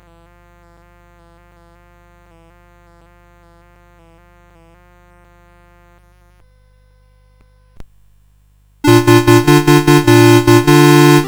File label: Caroling Caroling, CSG8580R5